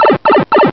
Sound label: power down; cstrike; gungame; smb3